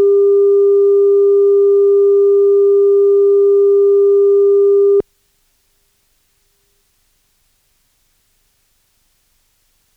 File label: 0dB 315Hz